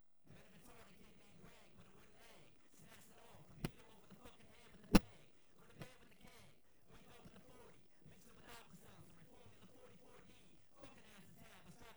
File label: Instrumental